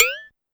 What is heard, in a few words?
Cowbell Up Fast, Pitch Env; Clavia DDrum-AT; Pitch Env Various